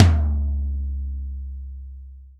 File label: tom, Real Drum Kit, funk kit; Best Service ProSamples vol.43 - Real Drum Kits